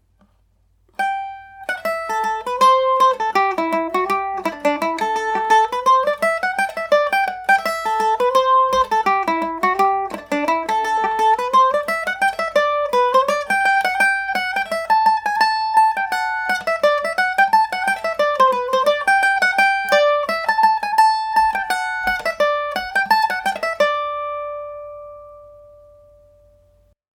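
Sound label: D mixolydian md304, Irish mandolin; old wheels of the world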